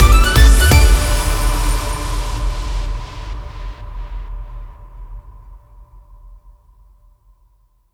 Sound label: big win end; netent